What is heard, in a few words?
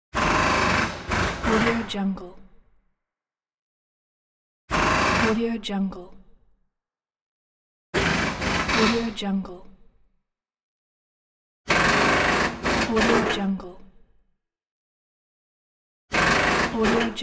auto repair shop